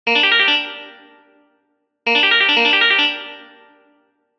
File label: sweep